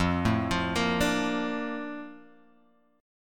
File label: A sharp Major 7th F